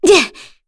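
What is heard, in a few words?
Vox Attack, Korean; FreyB; kingsraid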